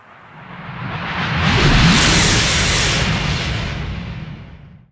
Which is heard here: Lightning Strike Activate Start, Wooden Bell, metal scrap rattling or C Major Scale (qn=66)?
Lightning Strike Activate Start